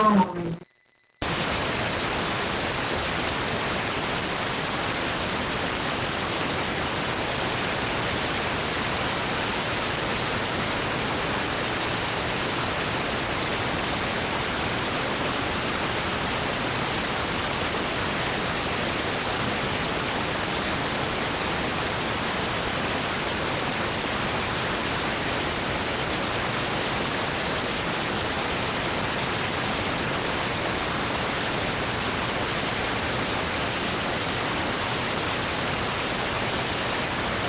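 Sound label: radioteletype receive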